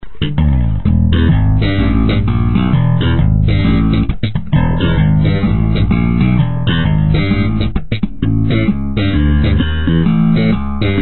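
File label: trb slap, bass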